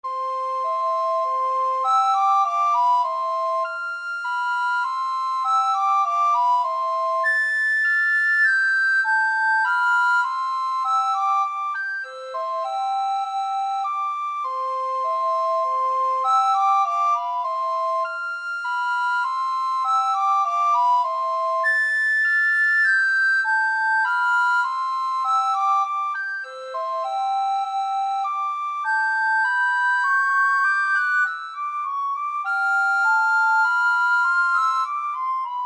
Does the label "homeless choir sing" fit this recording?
No